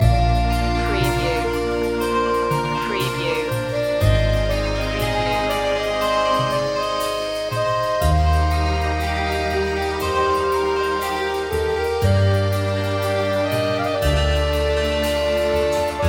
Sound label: mist accordeon loop